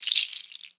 Goat Hoof Shaker, World Percussion; Cymatics; PHARAOH Beta Pack; Pharaoh Premium Drum Samples (BETA)

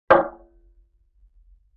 Barrel Hit Medium, Vintage Cartoon; Drums & Percussion

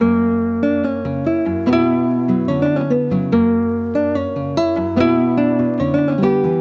Melody Loop 145 BPM B Min Chords; Loop Stems; Cymatics; 2020 Melody Collection; Various Melody Loop 6

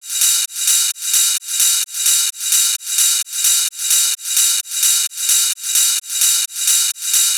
Ride Loop 130, Techno; Techno Sounds 2024; Rides

